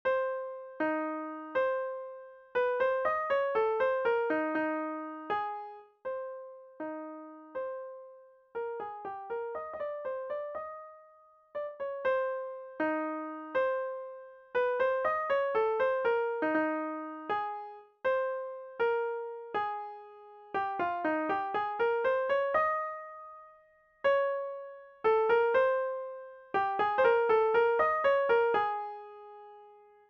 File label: Baritone B.C.